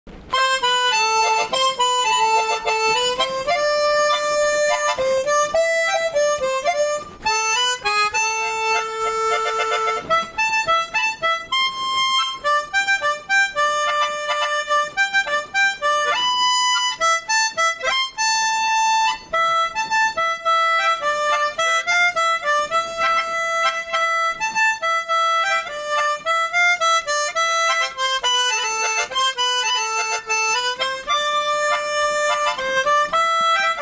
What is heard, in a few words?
Harmonica song